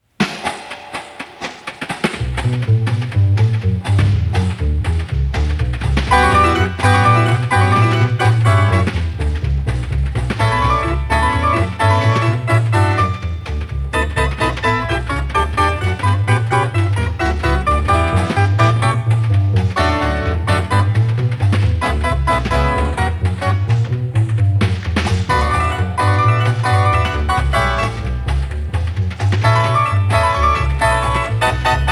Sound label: harpsichord rhythm; Ритм Клавесина